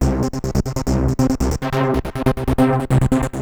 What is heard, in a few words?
Trance Bassloop 140bpm, Bass Arps